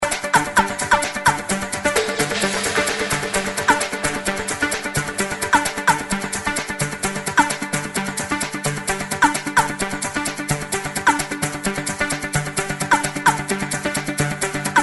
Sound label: spanish party tech version